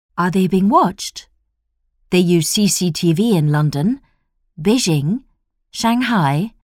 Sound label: techno